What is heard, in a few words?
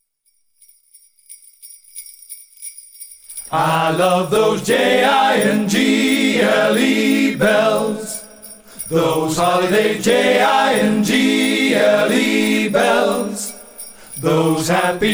Jingle bells